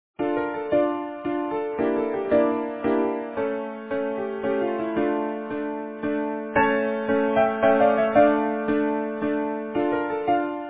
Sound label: Serenade, Easy Piano